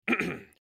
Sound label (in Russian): zvuki pershenie v gorle kkhm